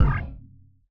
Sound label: Kick; Nova Sound